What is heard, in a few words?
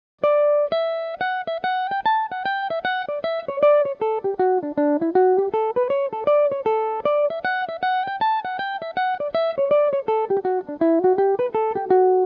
two versions, irish mandolin, tunes; chief o neills favourite